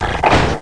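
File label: snarl snap; Total Chaos Nukem 3d Pack; duke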